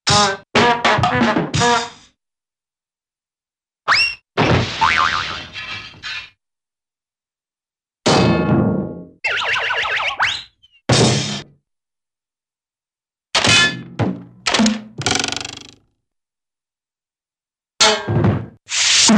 Fall & Tumble - Whistle - Impacts, Cartoon; Hollywood Edge; Cartoon Trax

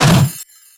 Creature Melee Magical; Kilta

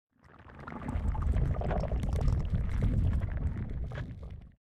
Boiling Water; Blind Quest - The Frost Demon

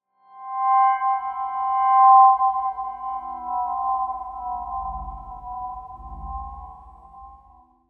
Tone Ring Mysterious Circular Ringing - Beginning; Hollywood Edge; sound designer's toolkit v2

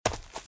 Footsteps - Leaves - Run - Left; RadwinIsland

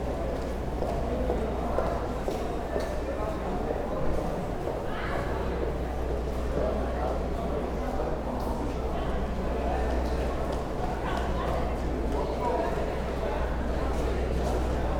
Urban people babble train station; urban and suburban; boom library

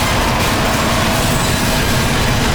Scrape Large Metal Grind Loop; Doors

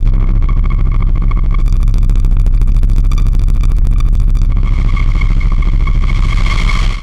Static; Blastwave

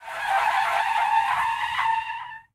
skid asphalt; Blind Drive

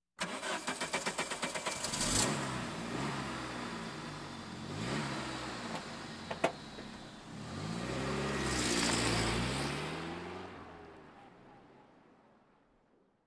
Automobiles various

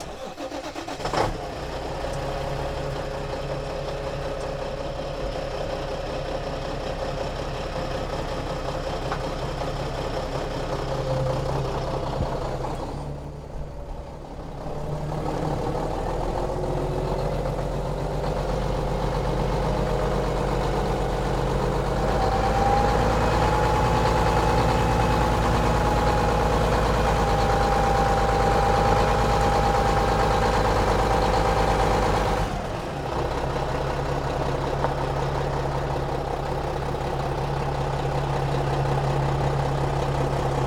Semi Truck Start; Large Vehicles; Blastwave

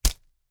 slingshot, dry firing, slap, rubber, rustle, shot; WristRocketPro; BlackMamba bands; Eiravaein Works; Vaeyan IV

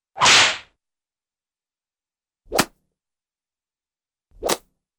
Swish & Sharp, Slappy Crack - Impact, cartoon; Hollywood Edge; Cartoon Trax

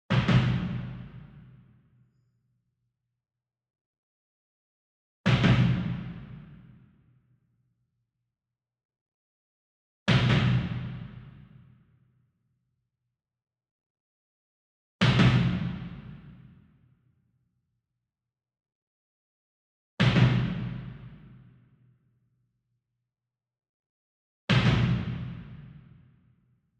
Bass Drum Marching Double Soft Beater Far; SINGLE DRUM; boom library; Cinematic Strikes Construction Kit

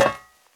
fire escape; blindside

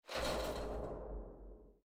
Reliquary; Blind Quest - The Frost Demon

